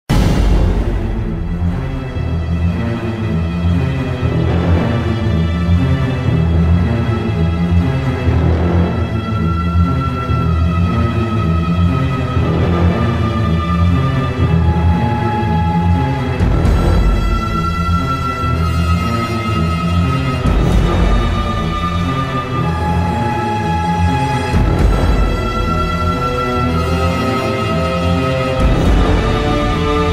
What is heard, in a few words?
Close Quarters Combat; Call of Duty 3; PS2; PS3; Wii; Xbox; Xbox 360